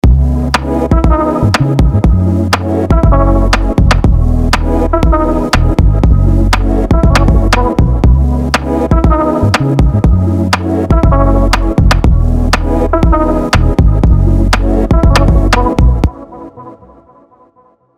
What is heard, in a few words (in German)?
Song gemastert mit Ozone 11 Master Assistant